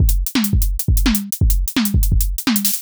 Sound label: jungle breakbeat 170 bpm 2 bars